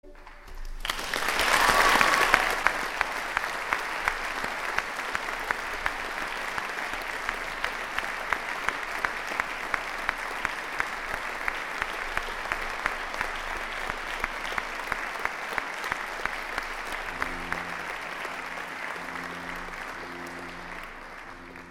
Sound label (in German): Chor und Jubilaeumschor Applaus